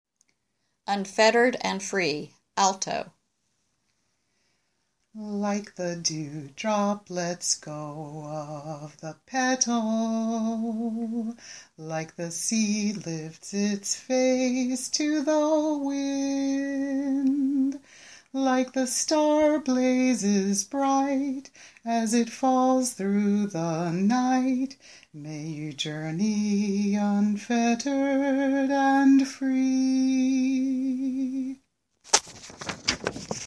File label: Alto, choir